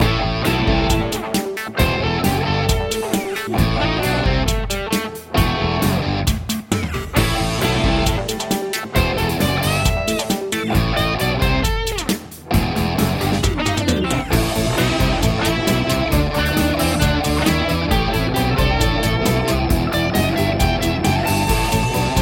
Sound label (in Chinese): 摇滚-激情-节奏